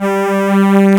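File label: G2, SYNTHESIZER, analog STRINGS; AKAI S6000 CD-ROM - Volume 1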